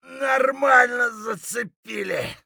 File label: hurt near death, voice; Escape from Tarkov